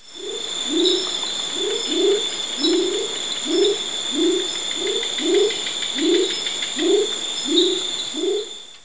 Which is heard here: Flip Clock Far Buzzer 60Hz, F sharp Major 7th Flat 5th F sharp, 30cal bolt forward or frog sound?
frog sound